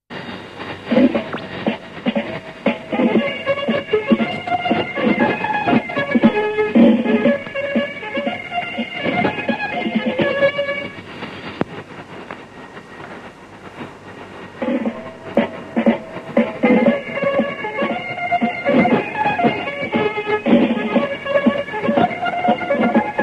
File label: drums and trumpets; irdial